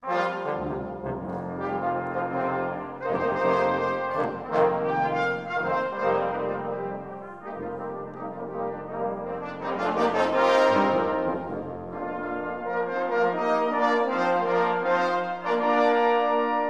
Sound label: Fanfare, Brass